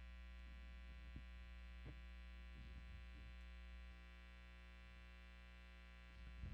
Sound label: bass takes